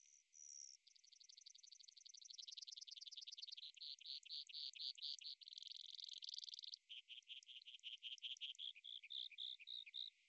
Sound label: Long Song, brewers sparrows; co alpine